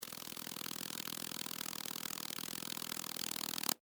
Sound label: Insect Wings Fly, Insect, Wings, Fly, By, Bug; Land; Wing Sound Effects; Wings (Sound Effects:Animals:Insects); Blastwave